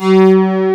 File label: MINIMOOG SOL; East West - Global Sounds; USA SYNTH